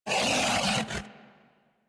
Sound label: Feral Vocal Melee Attack; Enemies; Kubrow; Warframe